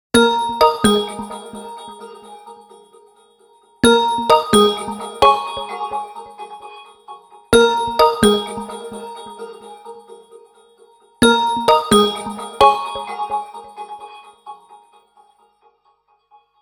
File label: Water Ring, Nokia Tones; Windows Phone apps